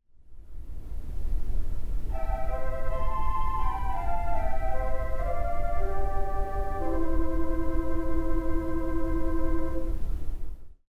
Concert Flute, theatre organ

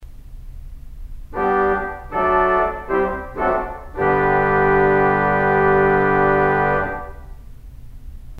Tuba, theatre organ

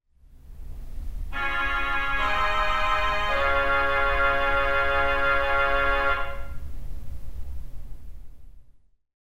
Saxophone, theatre organ

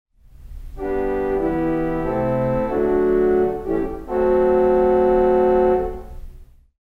Horn Diapason, theatre organ

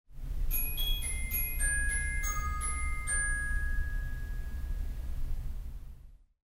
Glockenspiel, theatre organ

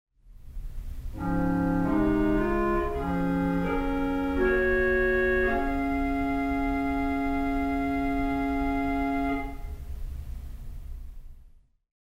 Clarinet, theatre organ

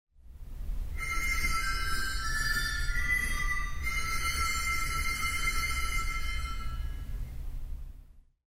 Sleigh Bells, theatre organ

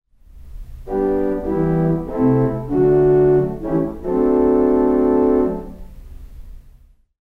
Diaphonic Diapason, theatre organ

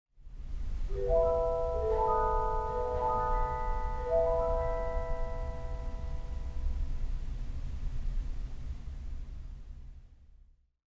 Chrysoglott

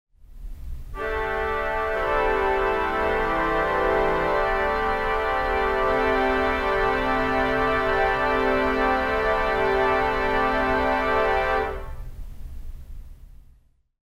Strings, theatre organ

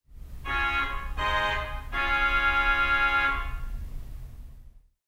Sax, theatre organ

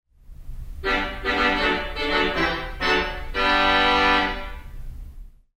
Post Horn, theatre organ

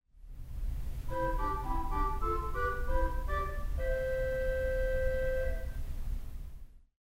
Quintadena, theatre organ

